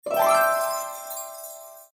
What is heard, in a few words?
transition; Red Riding Hood